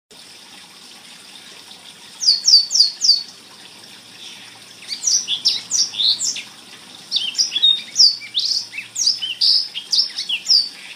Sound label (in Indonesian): Suara Burung Blackthroat Gacor untuk Masteran